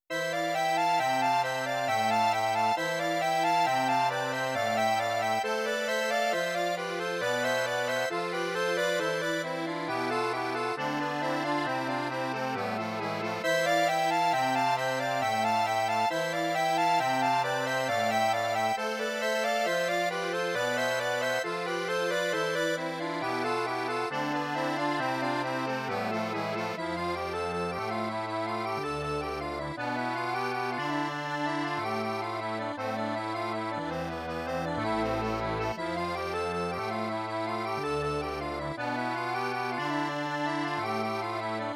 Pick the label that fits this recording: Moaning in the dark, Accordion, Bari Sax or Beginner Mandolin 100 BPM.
Accordion